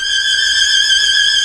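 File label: MINOR TRILL G3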